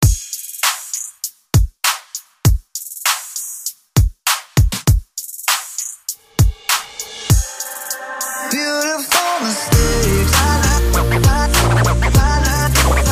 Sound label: 99 bpm